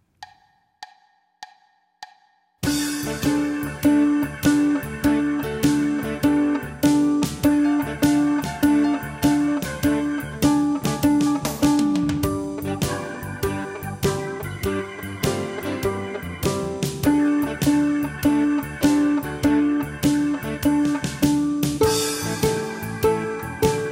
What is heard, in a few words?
open strings blues repeated